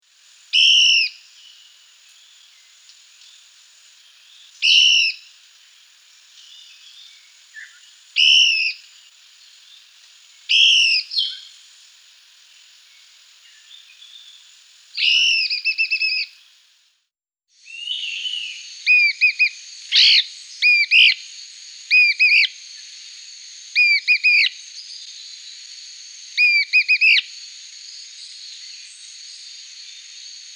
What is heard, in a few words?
Moucherolle gobe mouche Lesser Antilean Pewee; Guadeloupe; chants des oiseaux